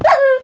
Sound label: hurt, wolf; minecraft; mob